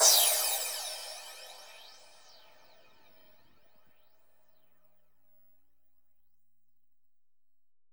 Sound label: odd crash; Drums In Your FACE!